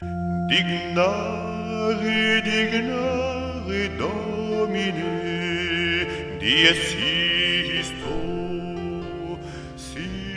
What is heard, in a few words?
Te Deum; Charpentier